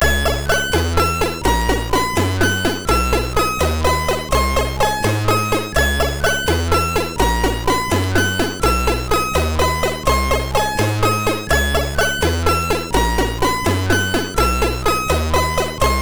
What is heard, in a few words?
Weird Song, MOS6581R3